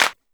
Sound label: Clap, Yamaha DD-20